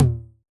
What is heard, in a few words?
Tom, Yamaha DJX 2B